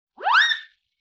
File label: Wind Whistle Zip; Vintage Cartoon; Musical Elements